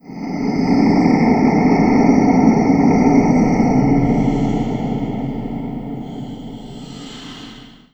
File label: zombie